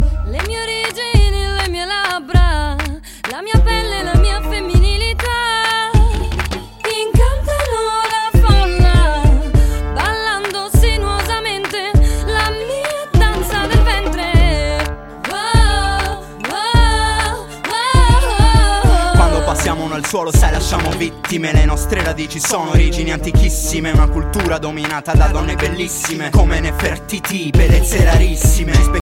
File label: italian tune ringtone